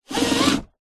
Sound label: vehicles car suv nissan patrol 1998 seatbelt pull